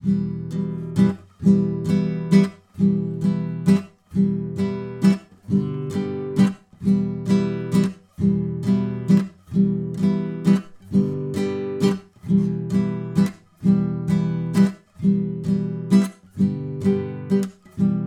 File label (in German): Gitarrenbegleitung